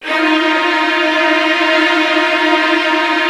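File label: Virtuoso Strings, violin trills, D#2; ILIO; Kirk Hunter Virtuoso Strings VOL-1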